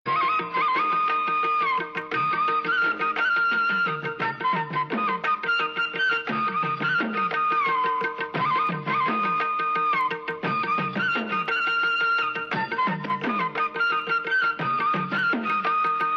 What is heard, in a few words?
Beautiful Flute